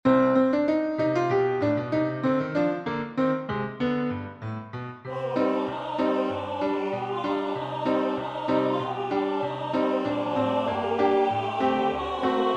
All Dynamic

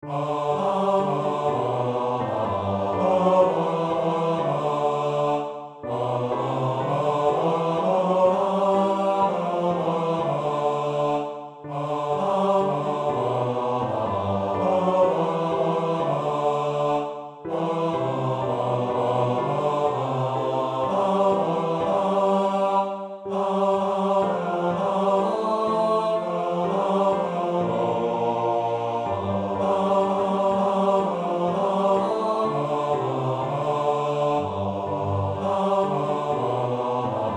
The Wexford Carol Bass

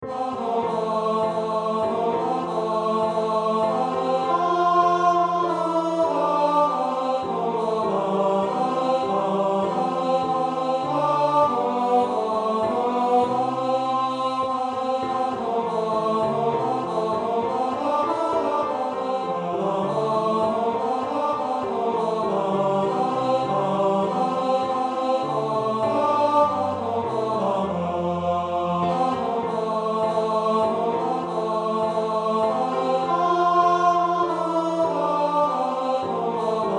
Away in a manger Tenor

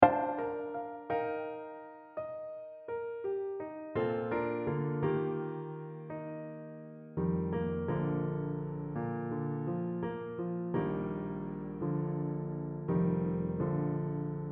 Upper Voices

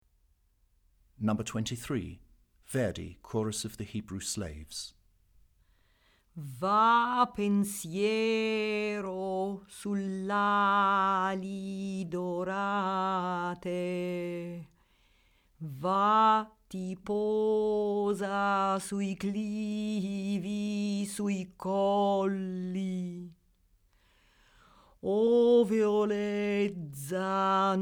Chorus of the Hebrew slaves; Verdi

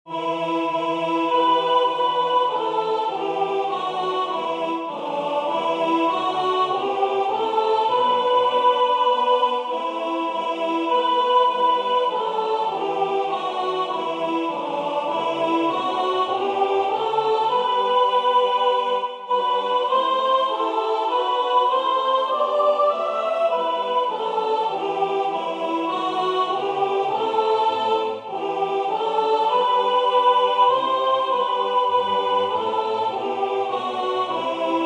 God Rest You Sop; God Rest You Merry Gentle Men; one verse here, to sing Unison

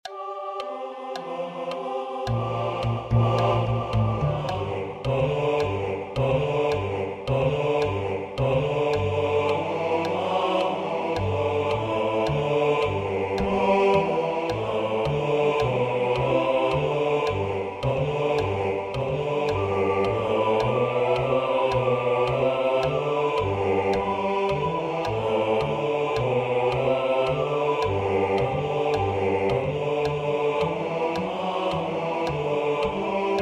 Sourwood Bass; Sourwood Mountain

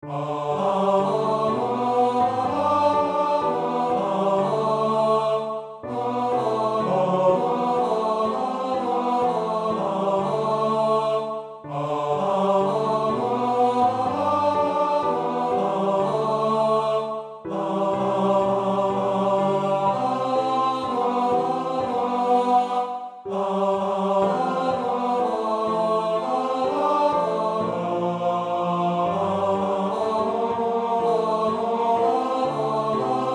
The Wexford Carol Tenor

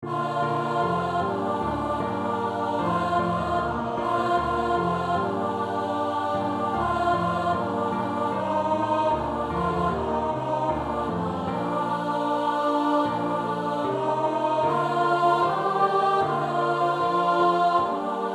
The cherry tree carol Alto

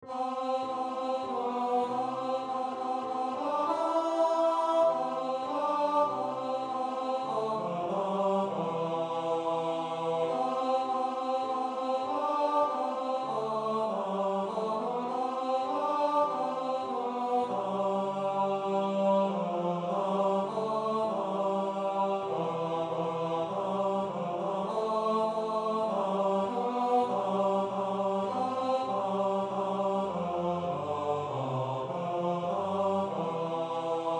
Up Good Christian Folk and Listen Tenor

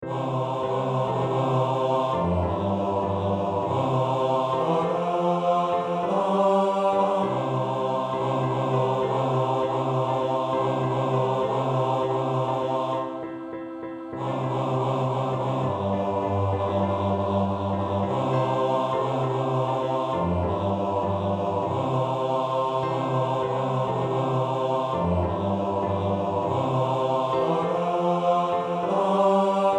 Joy to the world Bass

